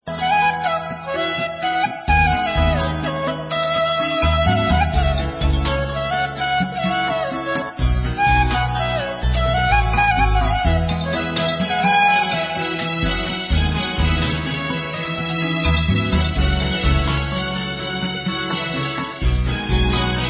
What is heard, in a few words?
sad flute ringtone